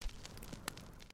fire hit

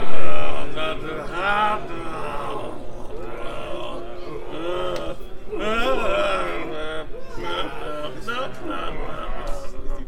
crowd mumble

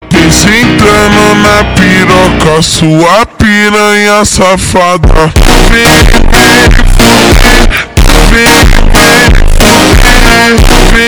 phonk

